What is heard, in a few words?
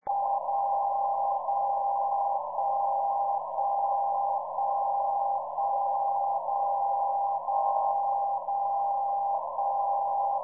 77.5kHz DCF77; Mainflingen; zeitzeichensender